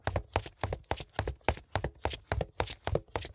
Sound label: Footsteps Loafers; LOOPERS